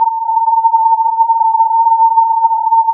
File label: filtered input